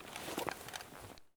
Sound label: gear stereo; Escape from Tarkov